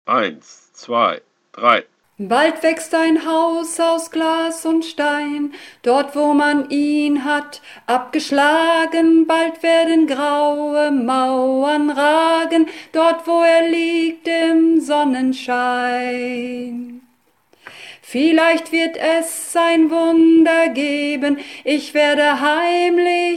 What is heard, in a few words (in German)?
Sopran Alt